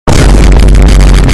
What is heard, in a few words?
vine boom bass bust